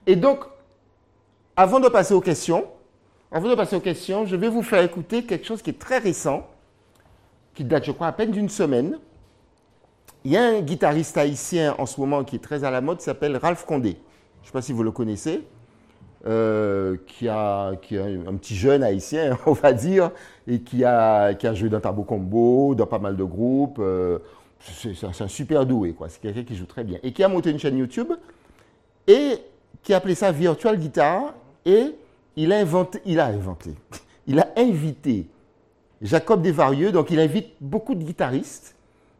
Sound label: virtual guitar; Salzedo